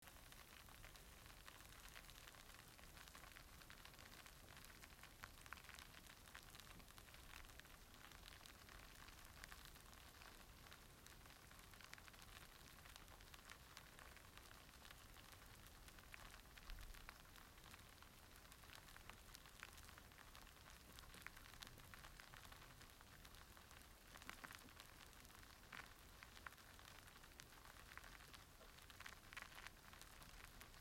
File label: Bubble Bath